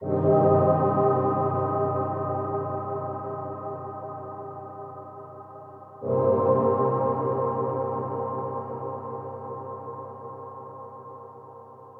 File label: SYNTH PAD AMBNT 160 C, Ambient; eJay; Sphere